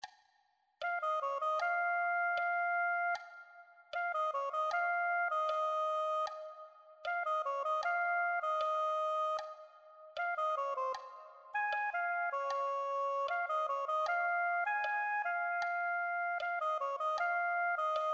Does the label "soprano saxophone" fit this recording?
Yes